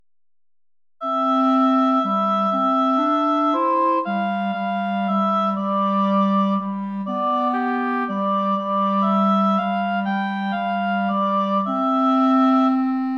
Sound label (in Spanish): danza cortesana para oboe clarinete